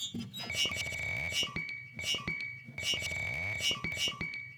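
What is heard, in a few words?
violin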